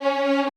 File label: F# C3, Orchestra; AKAI Advance Orchestra Upgrade 97 Vol.1